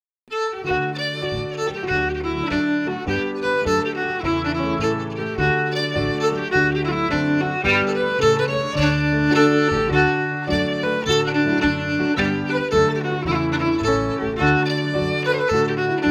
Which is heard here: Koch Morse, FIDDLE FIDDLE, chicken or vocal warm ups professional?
FIDDLE FIDDLE